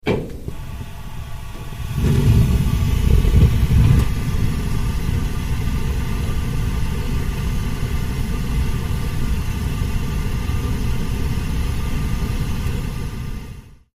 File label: Gas Oven Main Oven On, appliances